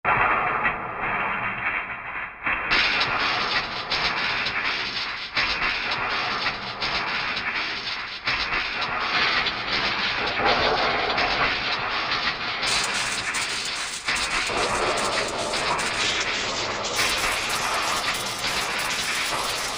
Factory; Korg MSC-14S Sound Effects

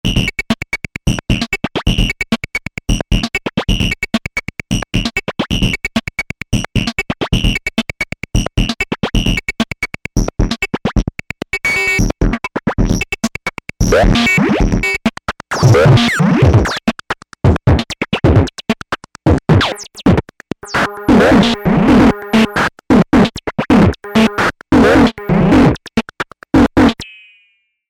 beats

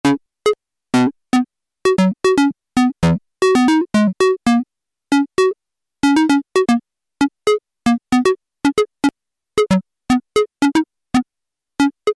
Auto-Pop, Alesis airSynth

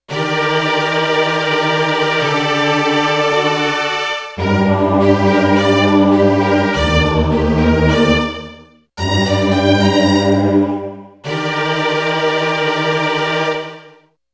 Choir Strngs

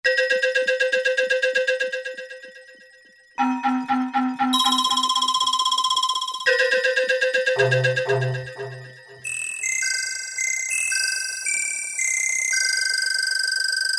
Jungle Tune, Roland D-110; Factory Patches

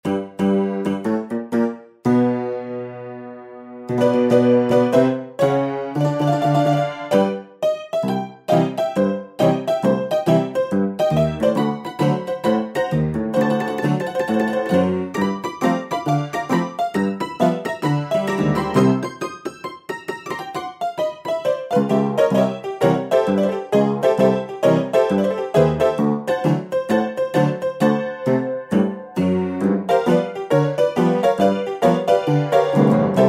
HONKYTONK

